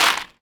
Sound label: Foley